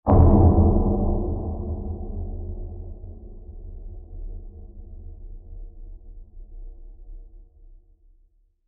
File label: Low Impact Blast; Crafted Signals